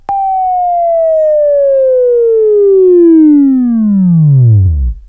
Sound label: Buzz, Right